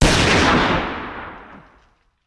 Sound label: Hand Gun; Sound Ideas; Guns